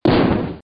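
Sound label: explosion; ambiera; coppercube3d; 3d game demo - darkness springs - mod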